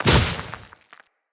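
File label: footstep on vegetation, enemies; Footsteps; Grineer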